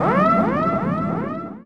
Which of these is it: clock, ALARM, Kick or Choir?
ALARM